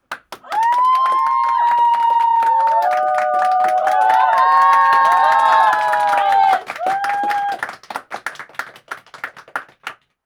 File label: small group cheer and applause; mixkit